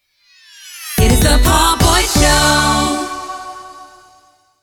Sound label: Jingle Sting 128bpm